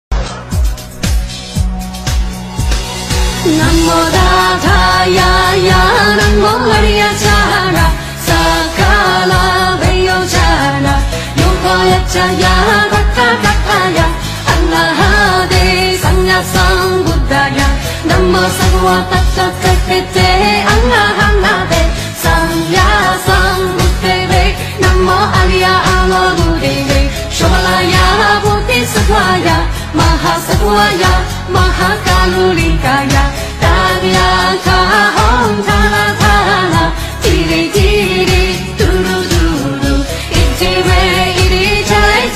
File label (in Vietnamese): Nhac Chuong Chu Dai Bi Tieng Phan Remix Tieng Phan